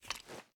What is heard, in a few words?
sm beta reload xmag rotate; gmod